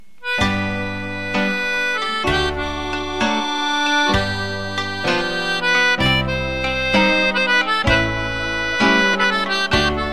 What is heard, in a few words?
Song from Maramures - Roumanie